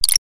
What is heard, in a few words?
click